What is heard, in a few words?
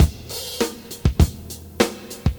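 100 bpm breakbeat d key, kick tuned to the D note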